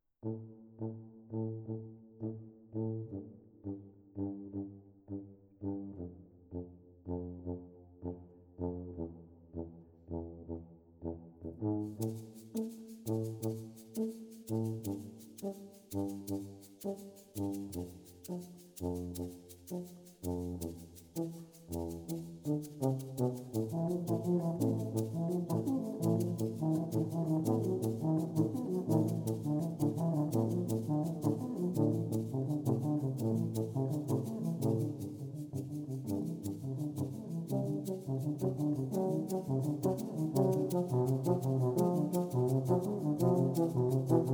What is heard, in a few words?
Tuba Trio